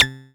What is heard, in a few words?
percussion; FutureRetro FR777